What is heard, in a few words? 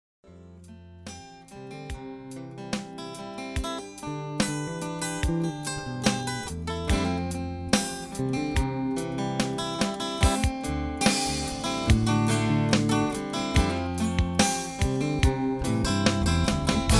Instrumental